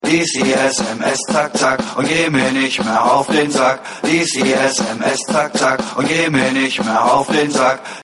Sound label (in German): schneller lustiger deutscher klingelton